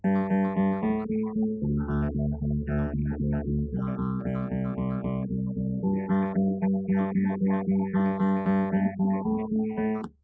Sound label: nodrums; SiSEC10; underdetermined